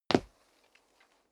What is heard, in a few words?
step sneaker wood parquet slide long